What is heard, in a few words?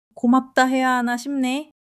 laughter